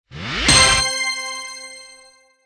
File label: pling inbetween longer high; Subway Surfers City Sound Effects; Android